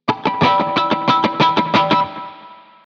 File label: brushing miss